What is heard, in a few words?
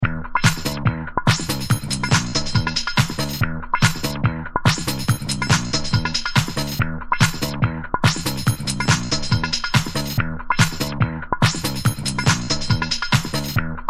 speedy funk